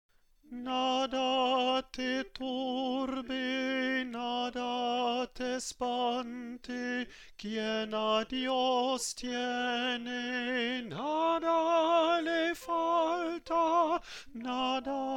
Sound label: alt